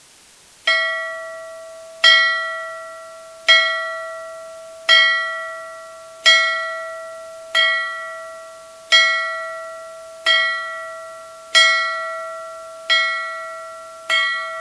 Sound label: bells